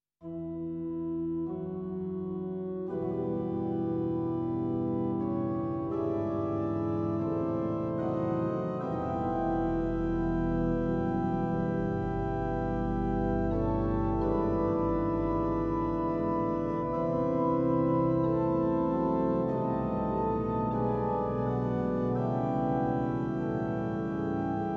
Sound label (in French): Fond d orgue